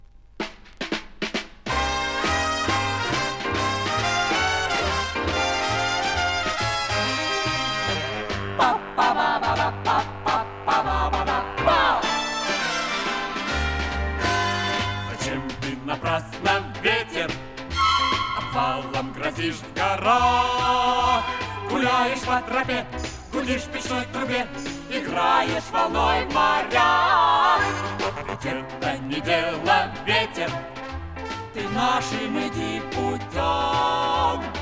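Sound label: vocal quartet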